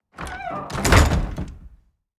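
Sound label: doors wood double close; wood double light 01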